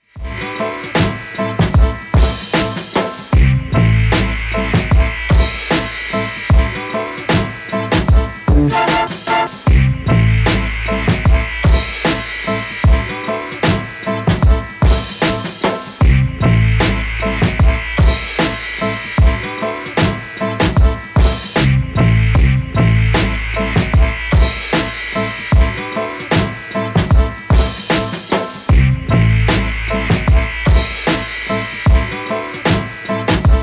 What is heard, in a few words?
Instrumental